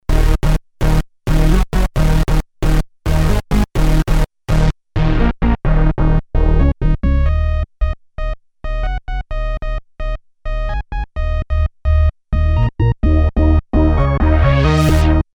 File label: BASS; ROLAND SH-201